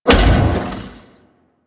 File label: Space Fighter Dredger Rocket Explosion; Rail Jack; Enemy Ships; Grineer; Agent